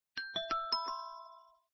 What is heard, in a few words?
Respiration